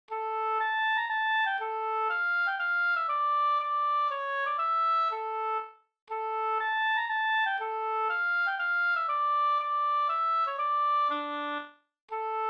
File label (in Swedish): polska